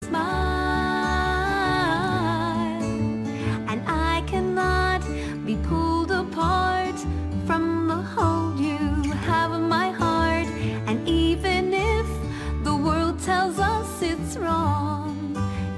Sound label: Catchy Song